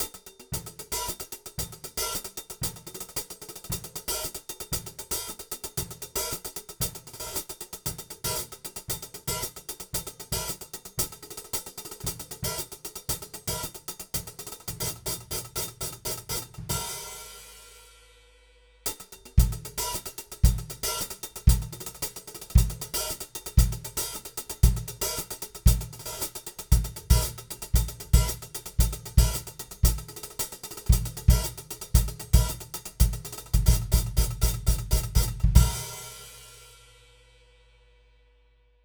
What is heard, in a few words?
hihat, KM84